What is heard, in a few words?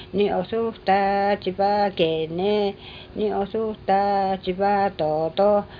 ni'osuh taa cipaa kenne ni'osuh taa cipaa kenne